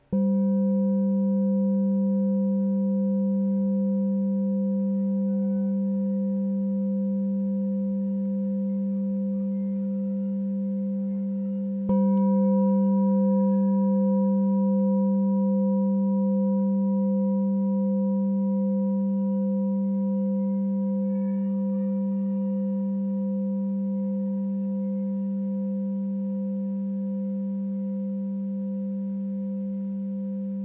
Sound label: LOW G +5, crystal bowl